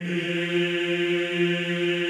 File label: EEH F2, Classical Choir; Best Service; Extended Classical Choir